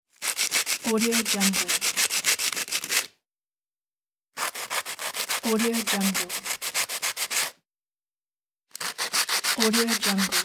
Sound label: coarse grit sandpaper wood sanding